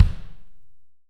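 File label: AMB KIK, ambient kick, studio; AKAI S6000 CD-ROM - Volume 3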